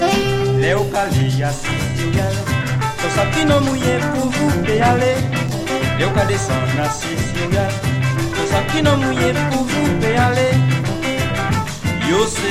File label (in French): musique calypso; musique societe et representation en guadeloupe de 1945 a nos jours